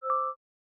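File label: bell glitch C major; granular; accents; bespoke - Floral v.2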